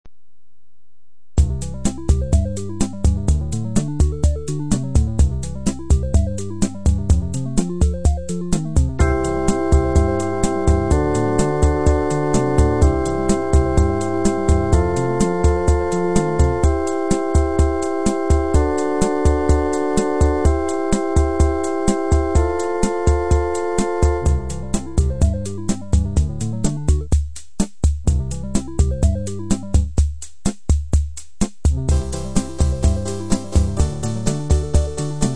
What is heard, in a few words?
kb500 rock